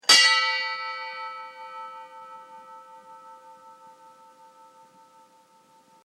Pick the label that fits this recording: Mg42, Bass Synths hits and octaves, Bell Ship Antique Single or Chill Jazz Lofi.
Bell Ship Antique Single